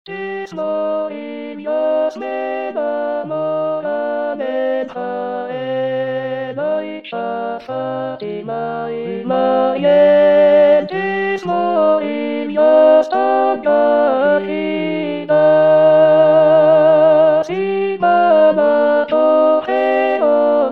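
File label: alto; Tres Morillas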